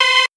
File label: Lead, Synths